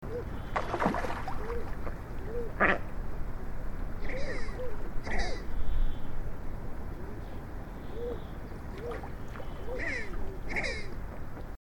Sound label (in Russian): Dlinnonosyj krohal Mergus serrator